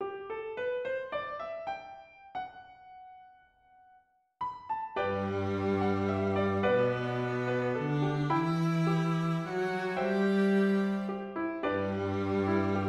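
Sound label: waltz, melody and viola cello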